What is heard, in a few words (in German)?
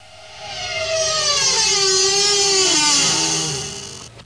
Tür Zu